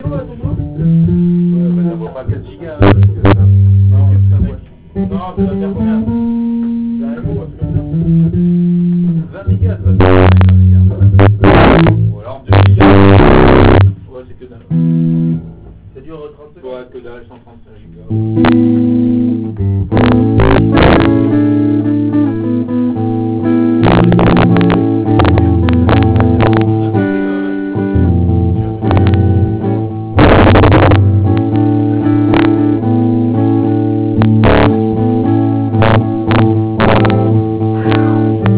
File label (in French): Impro Guitare; Cave